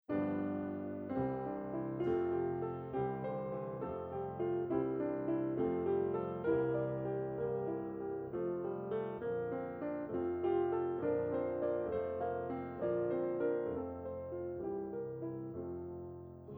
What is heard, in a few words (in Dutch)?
Sopraan, Gloria; Rossini; oefen CD; Versterkt